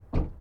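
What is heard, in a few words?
ferrari 458 onboard door close firm exhaust right; dpa4062; Sonniss GDC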